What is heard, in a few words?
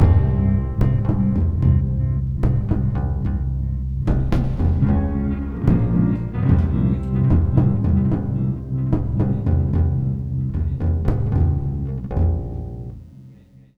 Full Mix C, Lounge; Zero-G Kocktail Kollection; Construction Kitz (Lounge); Volcano at Sunrise 074